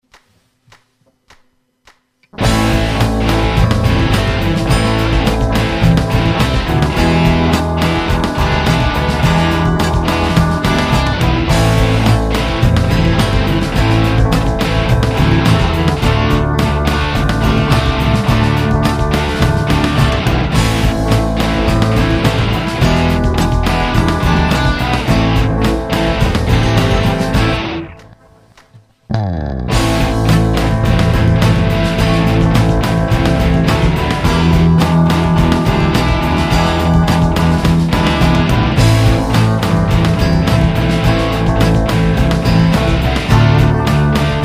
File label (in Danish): HBM mol Backing